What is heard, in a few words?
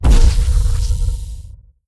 stellarite attack plasma; Stellaris; guardians